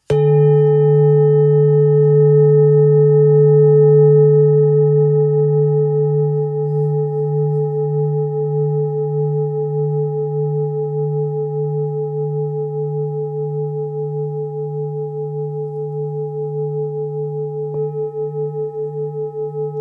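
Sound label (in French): Full moon grave fleur de vie, bols chantants tibétains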